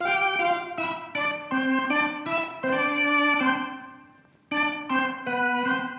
Classic Melody Loop - 160 BPM A Min Organ; Loop Stems; Cymatics; 2020 Melody Collection

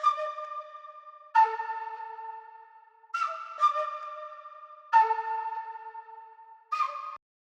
134 BPM D Min Flute, melody loop stem; Trap; Loop Stems; Cymatics; 2022 Melody Collection; Trap Pt 2; On Top

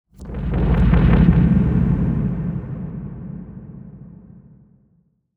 Riser

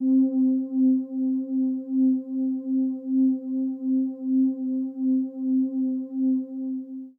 Subtle Pad One Shot - C; Lofi

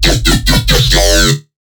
Riddim Bass Hit F#

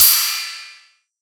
crash, cymbals, drum one shots; Crashes; Cymatics; Cobra Hip Hop Sample Pack

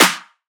Riddim Snare Clap

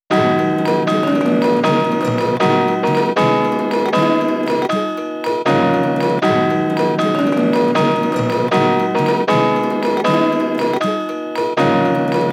157 BPM D# Min Bells, loop stems; Melody; Cymatics; 2022 Melody Collection Platinum Expansion - Pt 2; Psychic

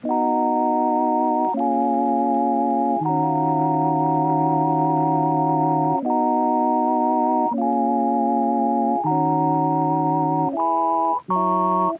Lofi Melody Loop - 80 BPM D# Min Keys; Loop Stems; Cymatics; 2020 Melody Collection

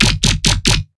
Riddim Bass Hit F Growl; One Shots; Ghosthack; Riddim Essentials 2020

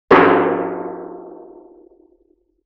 Oil Drum Hit Overtones; Vintage Cartoon; Drums & Percussion

